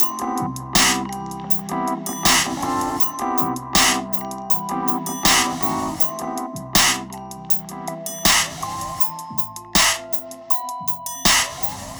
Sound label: Drones & Textures